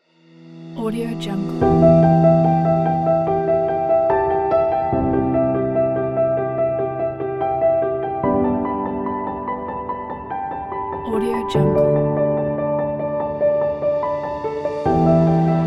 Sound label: inspirational piano